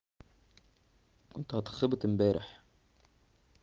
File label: traffic